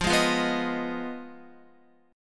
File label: F Minor 7th Flat 5th, Guitar; strummed; JGuitar